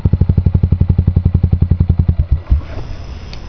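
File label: kill switch, Buell; Buell Blast; Recordings Taken from the Saddle; Shut it Down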